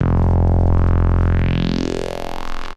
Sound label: synth; Houseworx; Bass